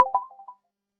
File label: area select